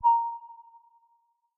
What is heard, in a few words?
sonar ping